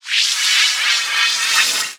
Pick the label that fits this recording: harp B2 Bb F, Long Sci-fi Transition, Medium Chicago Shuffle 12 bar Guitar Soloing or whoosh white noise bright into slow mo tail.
Long Sci-fi Transition